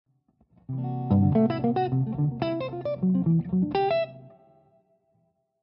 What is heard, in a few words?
Lydian Dominant Lines